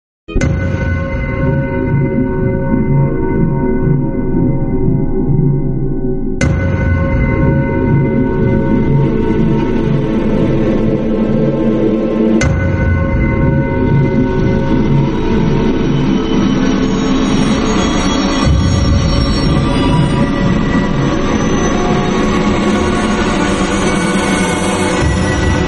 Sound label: terror fx